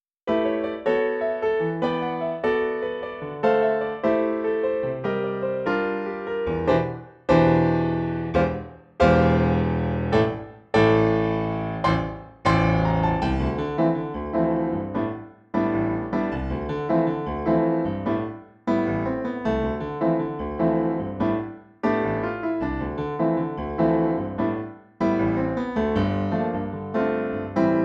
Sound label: jazz trio